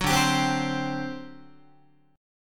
D 11th D, down, Guitar; D11 strummed; JGuitar

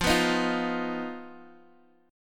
B-Major Flat 5th F

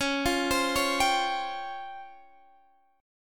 Db Major 7th Flat 5th Db down Guitar Standard; arpeggiated; chord shape; JGuitar

